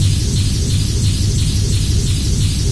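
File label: ambience high pressure; dm cavernous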